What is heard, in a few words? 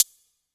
Cymbals Close HH; Vengeance Samples